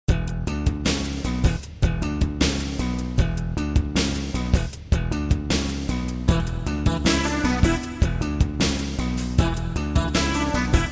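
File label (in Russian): muzyka ojydaniya koncerta, concert waiting music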